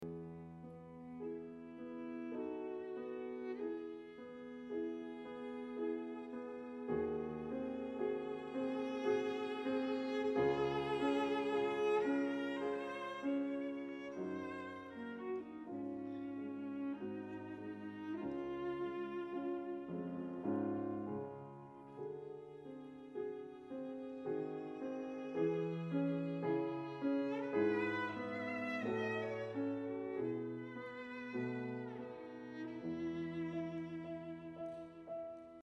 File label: arpeggione